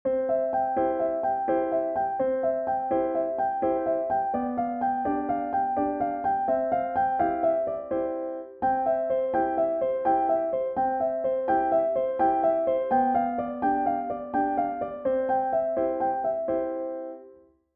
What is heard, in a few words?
Triplet study